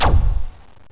fire laser Auto

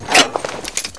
reload R P G Close Cover

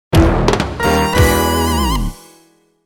Death

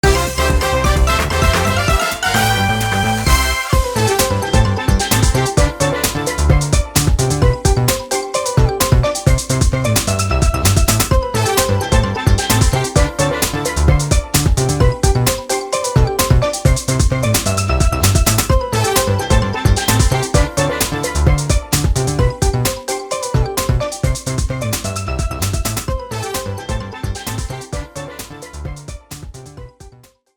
Minigame Win Jingle

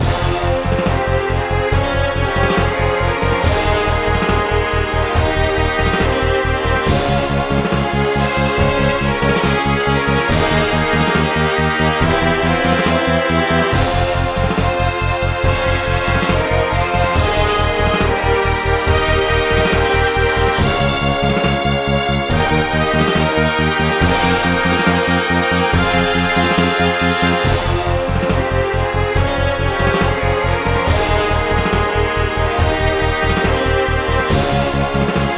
Promotion; knights in the nightmare; ds

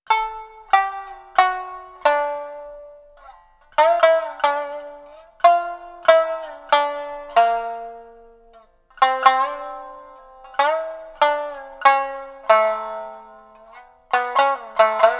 Shamisen Solo; Senren Banka; Do You Like Your Hardworking Onee-san

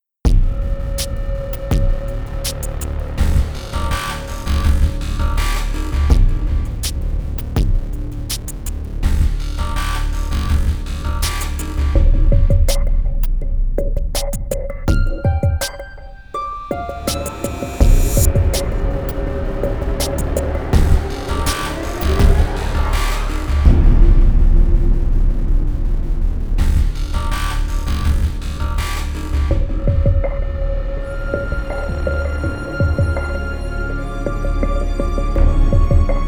Suspense; Red Jack

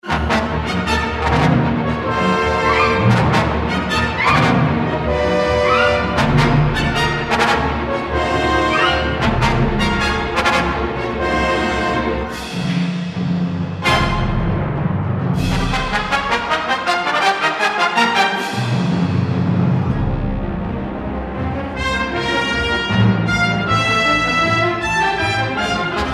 Forest - Combat; Scout Walker Scramble 1

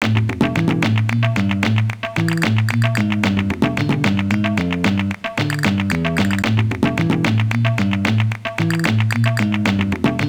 Flamenco; Tactical Ops Assault on Terror; Windows

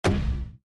Impact; Fancy Pants World 1 Remix

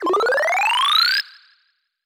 Progress Riser; boomerang fu

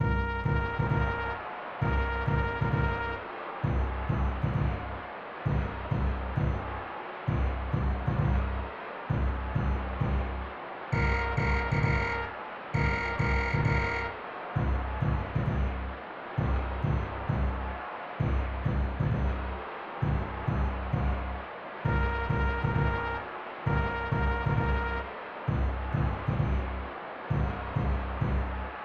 Baseball; Furuta Atsuya no Simulation Pro Yakyuu 2; SNES

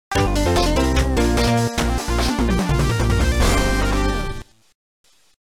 Mini Game Lost

